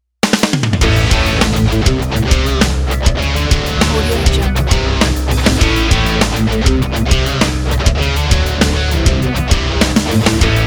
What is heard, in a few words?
overdrive